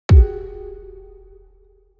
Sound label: step; brainrot; eco cavalo